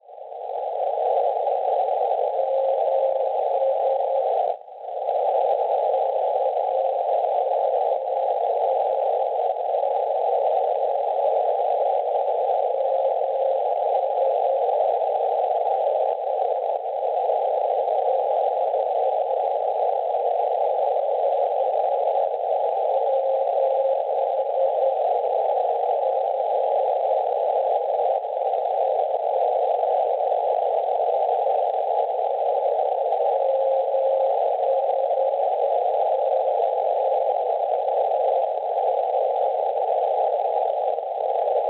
NDB 343 kHz; MS; Marsiglia; Francia; Schwarzsee

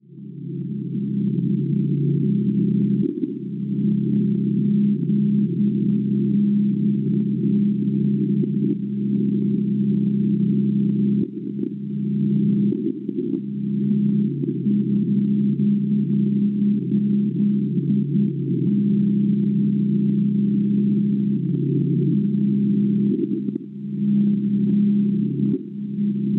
NDB 345 kHz; IGL; Ingolstadt; Schwarzsee

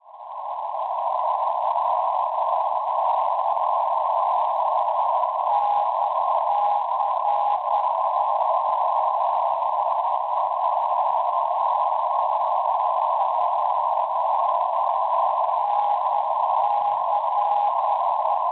NDB, 338 kHz; NC; Nizza; Francia; Schwarzsee

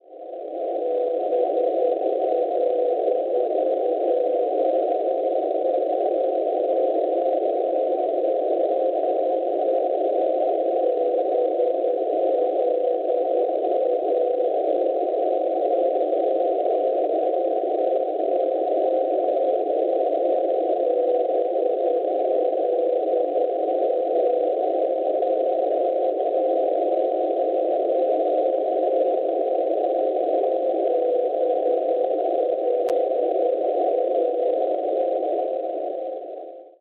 NDB Cerrione Italia 350 kHz; BLA; Schwarzsee

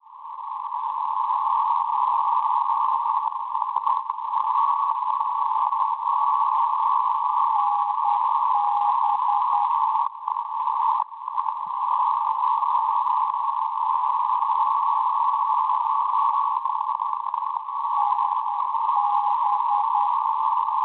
NDB, 361 kHz; NB; Bordeaux; Francia; Schwarzsee

